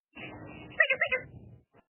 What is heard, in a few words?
Squeak